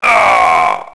get hit wounded; characters

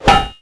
baseball metal solid hit; projectiles; melee hit; Max Payne

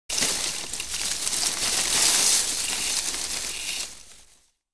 Leaves Rustle; Environment

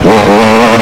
throttle, YAMAHA

